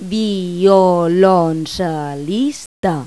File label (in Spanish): VIOLONCA; Pipo-Aprende musica con Pipo